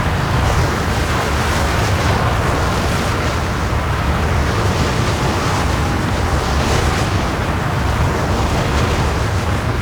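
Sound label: Deployment Cannon In Flight; Warframe; Hub; Tau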